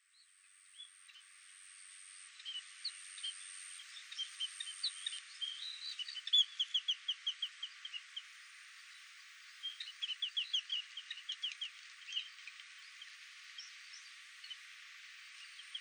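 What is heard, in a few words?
Scaly-breasted Munia; okinawa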